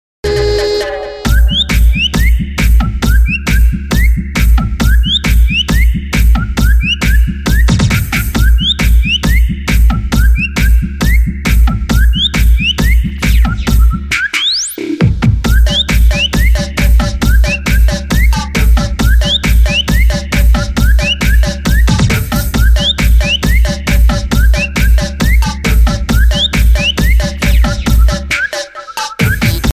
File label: techno